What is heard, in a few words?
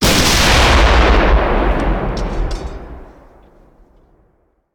Artillery Cannon Artillery; Sound Ideas; Guns